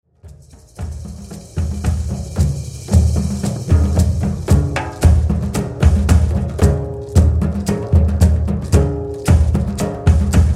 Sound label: Drums